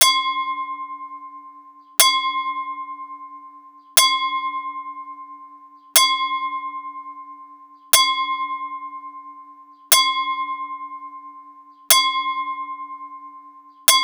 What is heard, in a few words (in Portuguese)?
Panelas